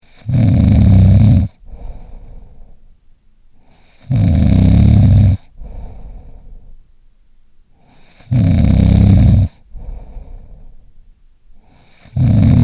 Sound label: louder SNORE tone